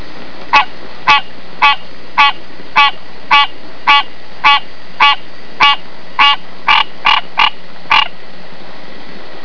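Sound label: green treefrog; frogs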